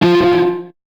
Guitar Note; curse crackers for whom the belle toils switch windows gamerip 2022

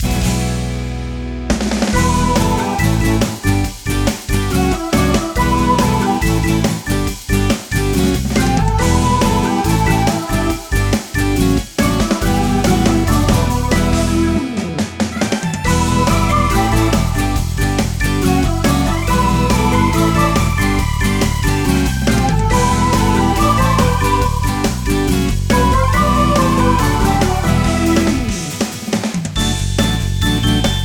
BGM; Heroland

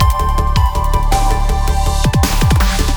Snow Transition; sky rogue

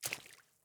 water land; teardown; ps5 windows xbox series xs

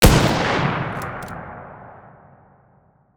tools rifle

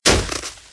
Snow Hit

